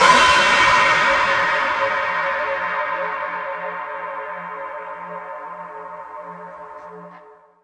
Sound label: cym; drums